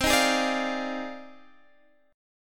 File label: F Minor 13th, guitar chord